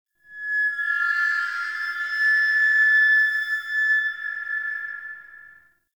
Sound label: Interior Stadium Feedback; Nineteen Ninety Nine